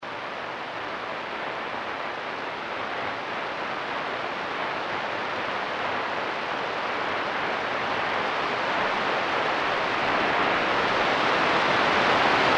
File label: 20.25MHz; Sun; Ashcraft